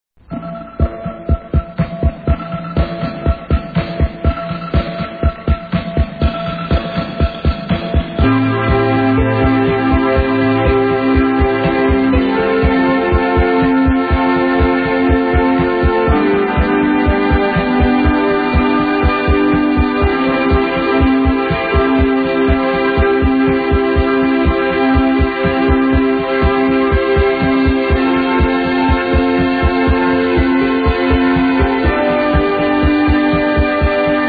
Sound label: interval signal; rfi